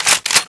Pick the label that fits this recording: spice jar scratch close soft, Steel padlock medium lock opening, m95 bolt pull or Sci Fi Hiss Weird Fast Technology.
m95 bolt pull